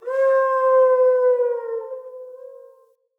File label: Wolf Cub Trapped Call Distant; Animals; Wolf Cubs; Orengal Wolf Cub A